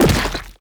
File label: heroes veggiefy death; stregi